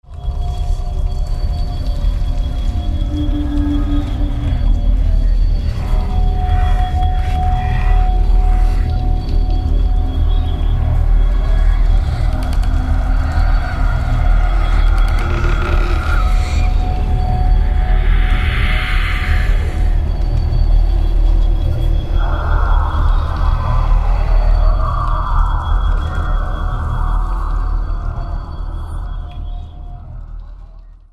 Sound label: horror mix